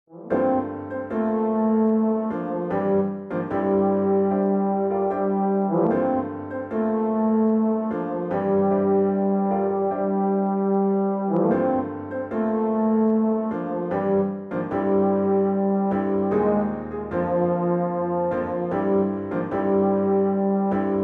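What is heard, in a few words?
Festival Fanfare euphonium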